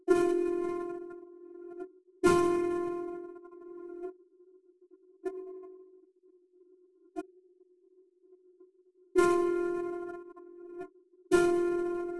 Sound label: piano NMF